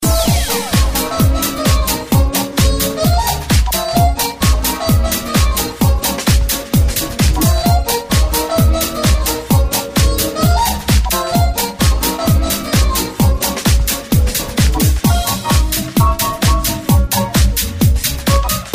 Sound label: jazz sms remix